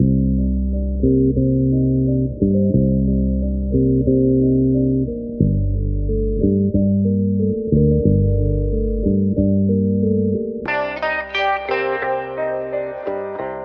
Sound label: guitar